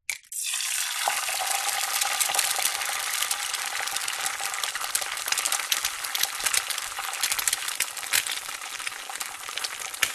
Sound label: egg crack into hot frying pan cook sizzle spit oil; zapsplat